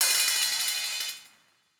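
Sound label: open hi-hat; eddyflux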